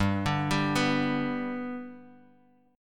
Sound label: G Major G down Guitar Open G